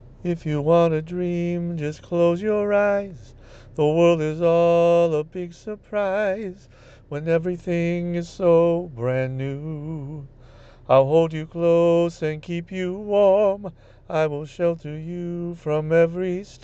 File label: Lullaby Original